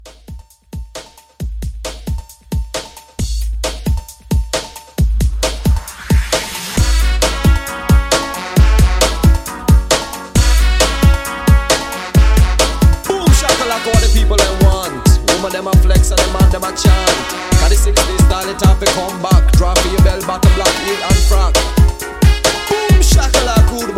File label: 90s Pop Mixshow Re Drum 134